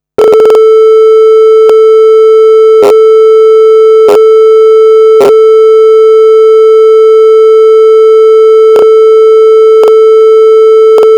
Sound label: test 440; Volumio2; pi3b+; lan usb